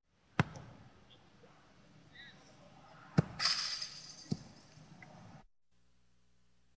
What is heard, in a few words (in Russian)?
udar i popadanie v setku mjach padaet